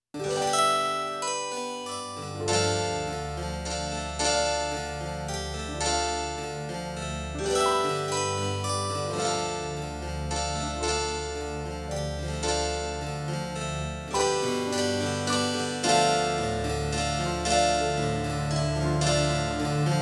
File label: harpsichord feeling